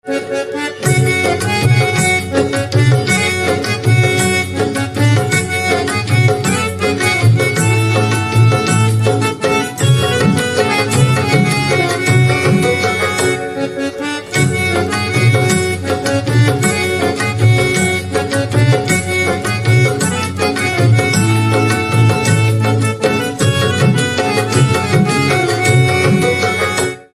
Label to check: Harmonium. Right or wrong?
Right